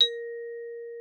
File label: XYLS A#4, VIBES; Xsample VOL-4